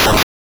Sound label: cut, attack sound; カタナ１